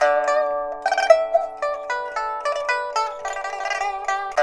PIPA, 90; INIS - Opium

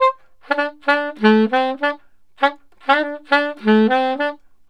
SAX, Balkanish Traditions

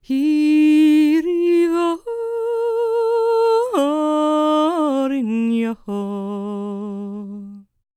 MOURN, Vocal Planet World Voices CELTIC; ILIO; Vocal Planet VOL-5

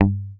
HOFNER MUTE, Bass

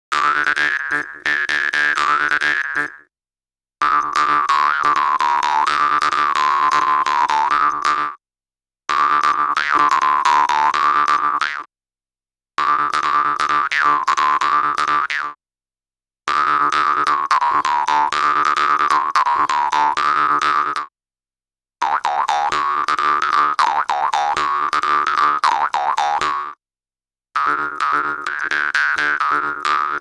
Didgeridoo And Overtone; Good Stuff Audio

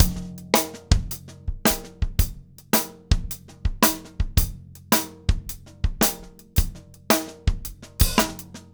TRIP, 165 TRIPLETS; Steve Gadd Drumscores

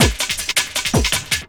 Loop, Drum & Bass, 160 BPM

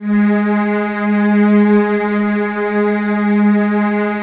G#2, Strings; Virtuoso Strings; ILIO; Kirk Hunter Virtuoso Strings VOL-2; 90 s Sample CDs

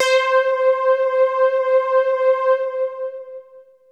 Techno leads, hits and riffs

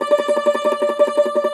cumbus saz D, plucked strings

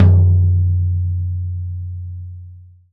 velocity tom; VELOCITY TOM 1

